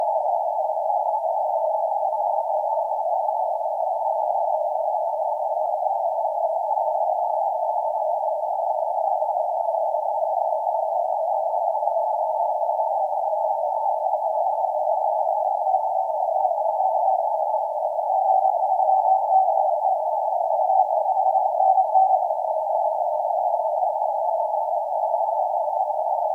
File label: 17m CW